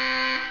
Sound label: warn